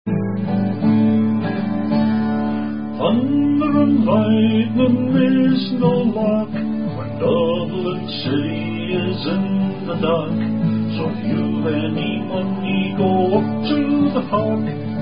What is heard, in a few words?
12-String Guitar; Semi-Bawdy